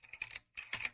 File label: slide